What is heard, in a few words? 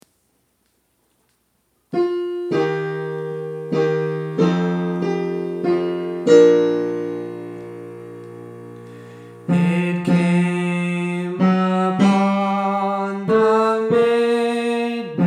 Tenor